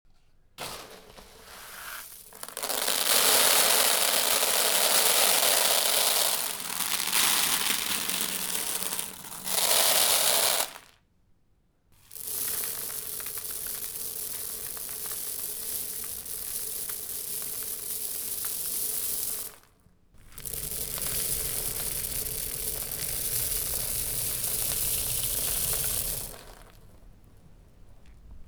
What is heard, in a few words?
pouring particles 6 times